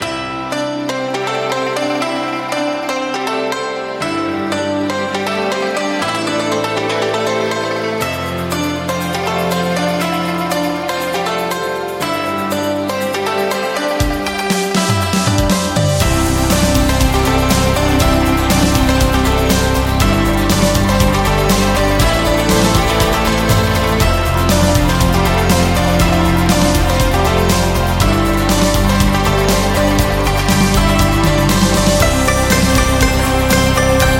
Chinese New Year

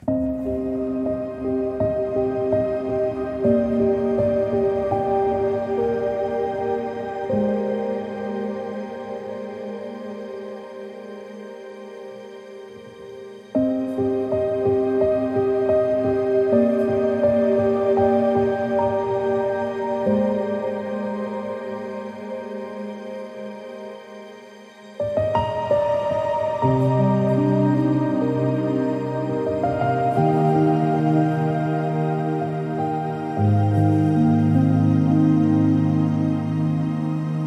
Carved by Rain, ambient piano

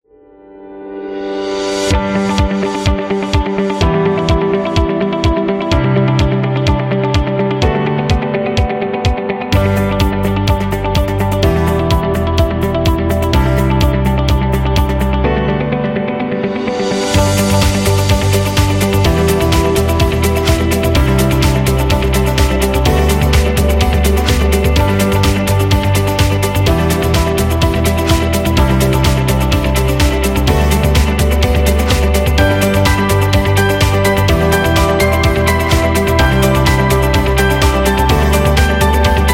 Technology Upbeat

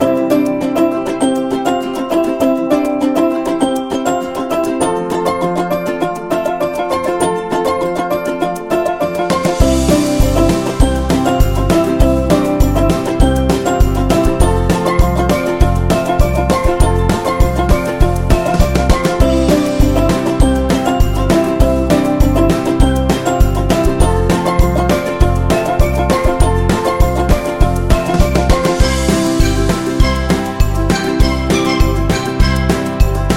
Comedy Funny

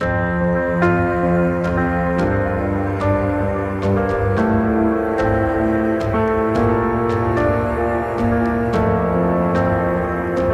Piano

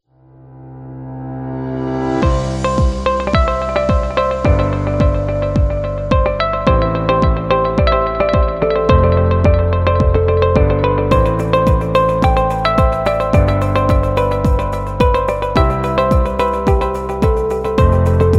Soft Background

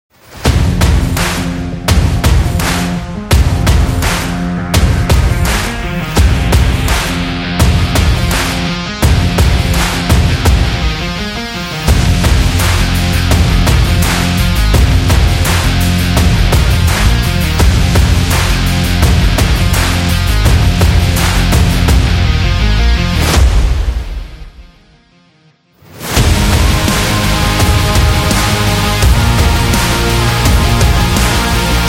Sport Rock

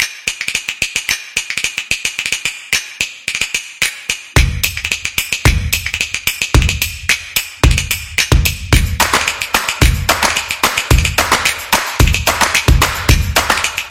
Clap Energy

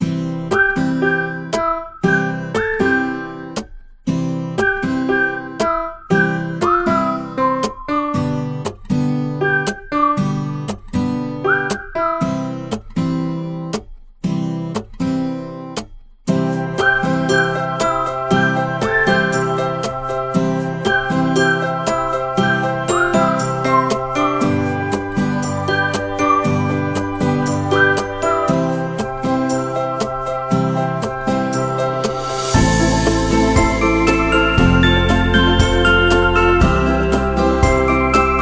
Happy Whistle

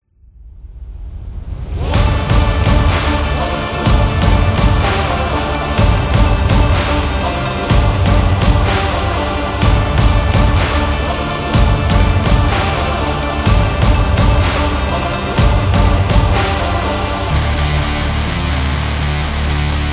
Stadium Indie Rock Sport

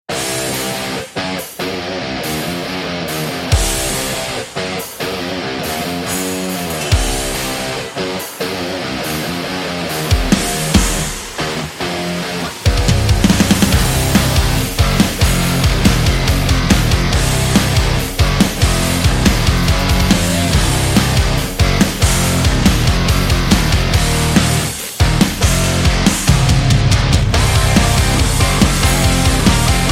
Teaser Uplifting Rock; active rock music

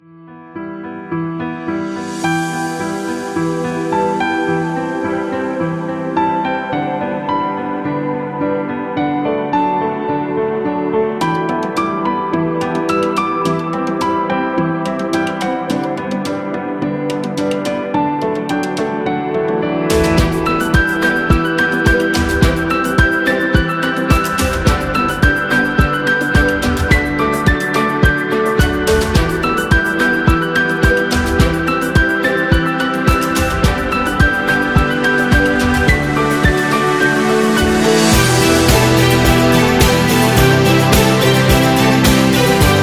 Emotional Corporate

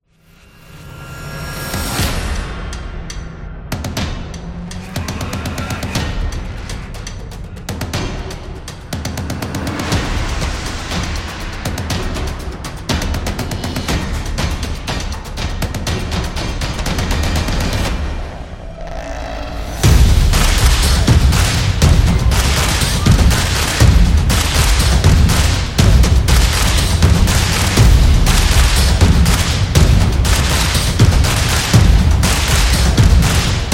Sports Stomps

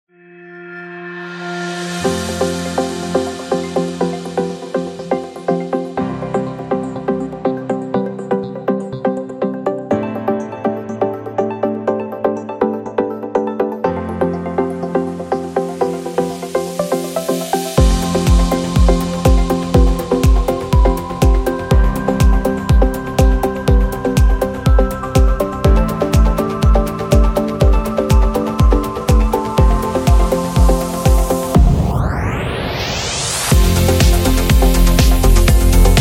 Corporate IT Presentation

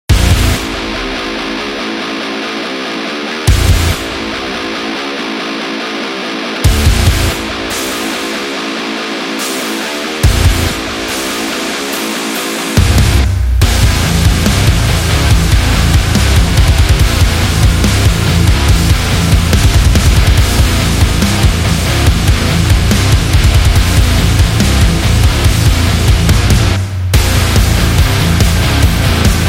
In Metal

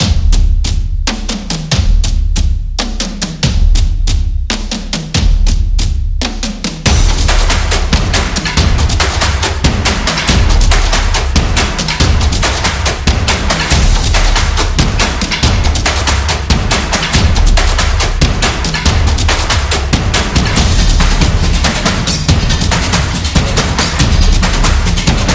Drum Percussion

